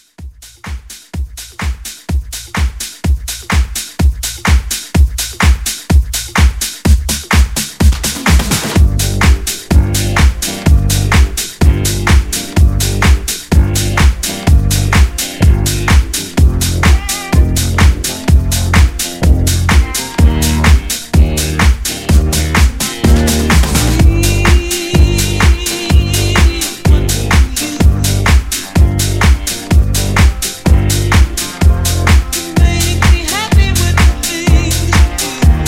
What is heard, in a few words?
70s Rock House Re Drum 126